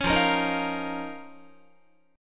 F 7th Suspended 4th Sharp 5th F down Guitar

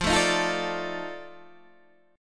F 13th F, chord

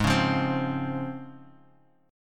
Db Major 7th Ab

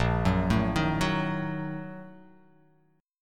A sharp Major 7th Suspended 4th Sharp 5th

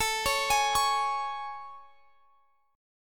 A Major 7th, Guitar Standard; arpeggiated; x,x,19,18,21,21; capo=2

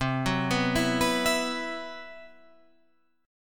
C Major 7th Flat 5th C down Guitar Open F; arpeggiated; CM7b5

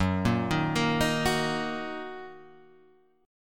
Fsharp 6th down Guitar Half Step Down

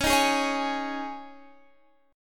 G sharp Suspended 4th C sharp

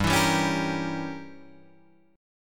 G Diminished 7th, Guitar, Drop D

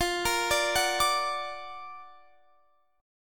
F sharp Augmented Major 7th, F

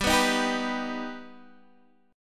G Augmented G, Guitar Standard; G+ strummed; 15,14,13,12,12,x

